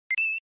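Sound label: Game Boy General Pitch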